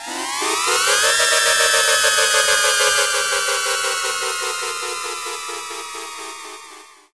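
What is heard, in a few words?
SYN right, Production Effects; Best Service - XXL Production Effects; SYN-FX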